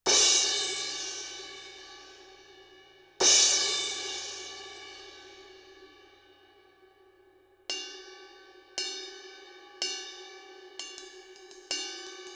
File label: Cymb Crash